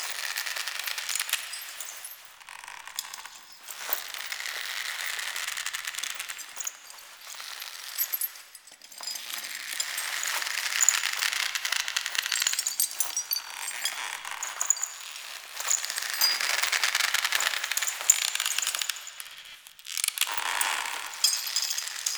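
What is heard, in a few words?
hammock swing loop; Ambience; Props; Warframe; TheNewWar; Zariman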